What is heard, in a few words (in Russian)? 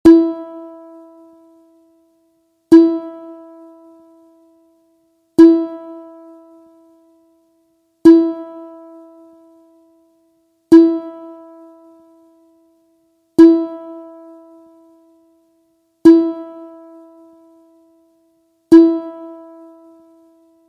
1 struna Zvuki strun ukulele